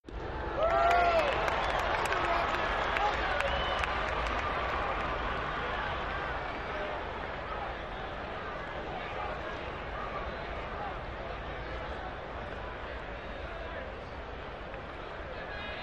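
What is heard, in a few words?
Arena Crowd Ohs Cheer; sports arena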